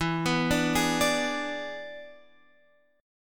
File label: E 7th E down Guitar Drop D